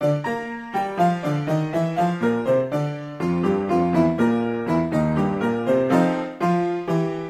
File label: Little Arctic Circle (Piano); Sam & Max The Devil's Playhouse gamerip